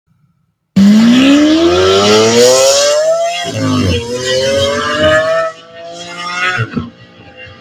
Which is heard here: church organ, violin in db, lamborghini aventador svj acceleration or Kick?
lamborghini aventador svj acceleration